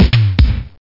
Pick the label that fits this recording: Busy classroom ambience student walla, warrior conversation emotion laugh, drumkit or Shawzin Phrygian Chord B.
drumkit